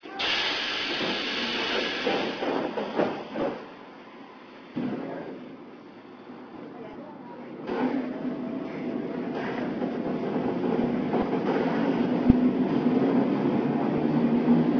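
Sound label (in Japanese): 上毛電鉄デハ350形Ｃ 赤城駅出発時の走行音; 赤城駅出発時の走行音（2000年3月20日収録）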